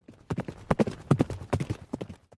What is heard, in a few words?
horse